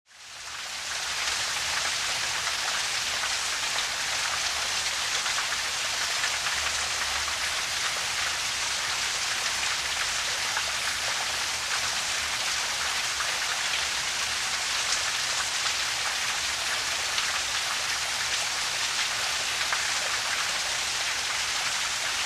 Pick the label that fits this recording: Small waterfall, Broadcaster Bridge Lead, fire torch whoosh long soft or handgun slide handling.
Small waterfall